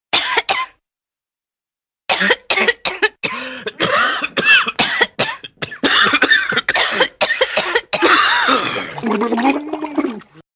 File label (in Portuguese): tosse